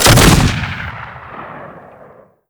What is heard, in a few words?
fire